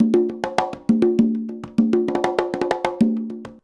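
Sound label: 133.5 CGAF, conga; Univers Sons; Basicussions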